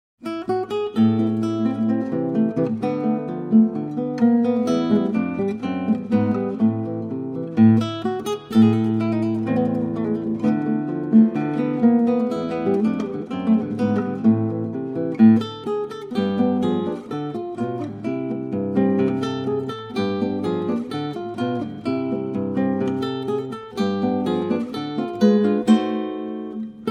Czardas

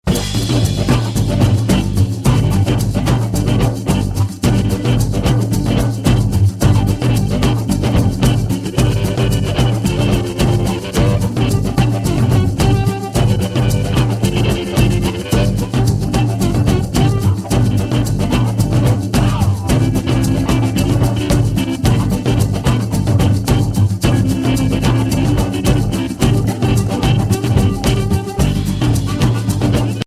Bonafied, jazz drumset

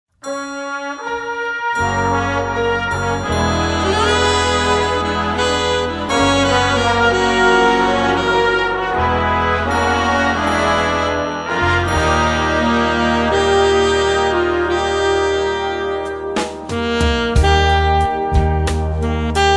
Alto Sax Solo; Marc Reift

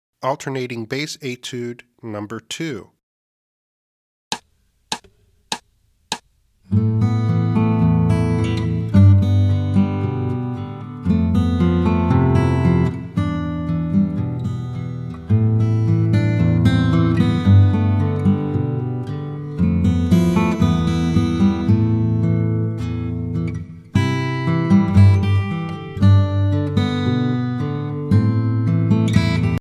Alternating Bass Etude; alfred publishing

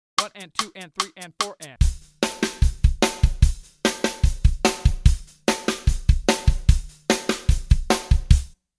Fifties Rock; Warner Bros Publications

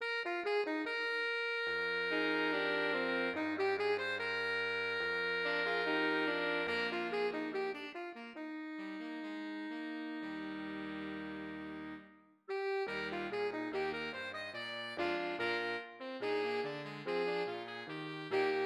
pizzicato polka; delibes; kendor music

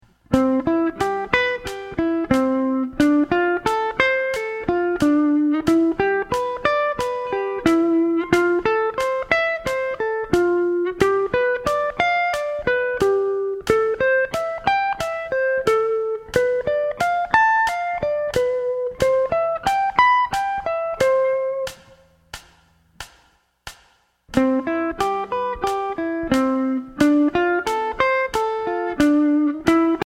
Diatonic 7th Arpeggios Example, Jazz Guitar

